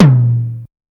Tom, dx

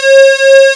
SQUARES, Akai S6000, dance

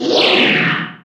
FX Dance; Sound & Vision - Gigapack II

